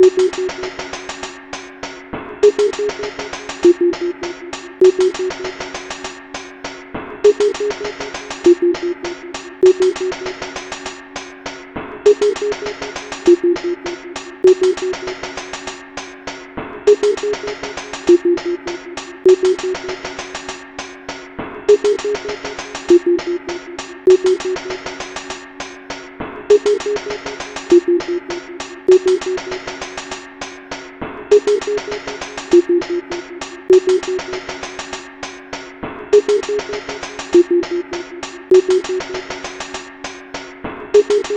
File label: Tubes (melody)